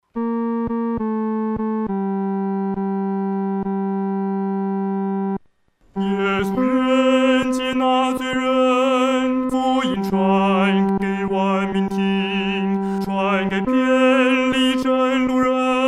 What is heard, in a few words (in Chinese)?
独唱 第三声